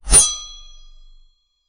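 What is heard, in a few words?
ethereal blue magick sword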